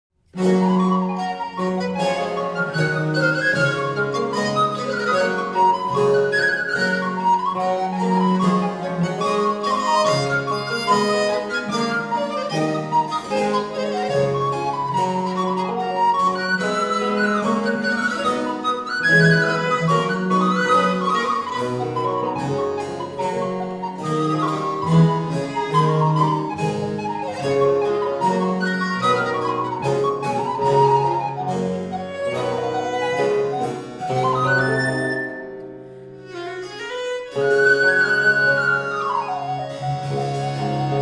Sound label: Preludio in G Major, violin; Ayres for the violin Book 3; Nicola Matteis